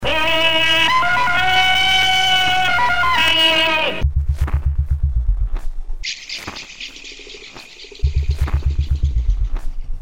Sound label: whoop; Not quite what people have in mind when they say jungle beats